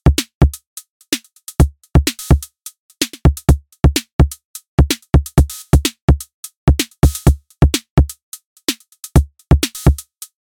TR-606

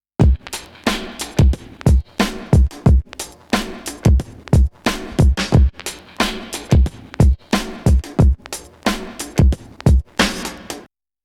Loop alt tube

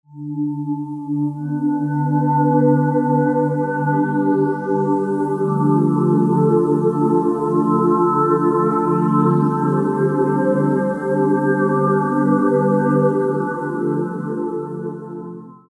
Stonehenge Lofi

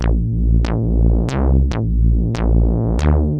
perc bass

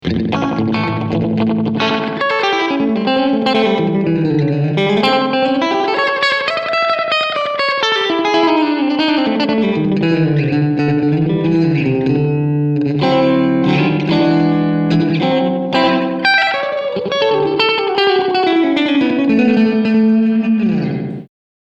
KS Short Delaytime

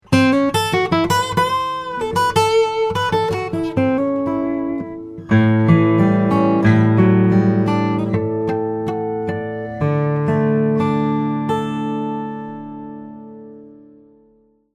Git Dry; Adax

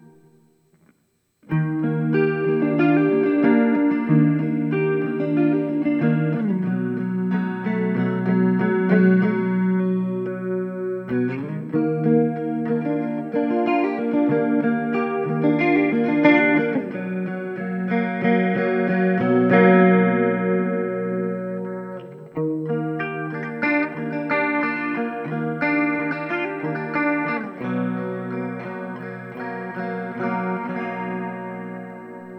Modulation

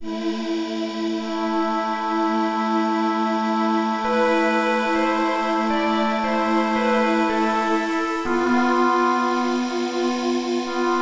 pad

